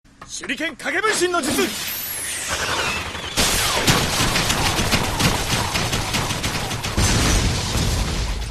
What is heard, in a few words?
Shuriken Soundeffect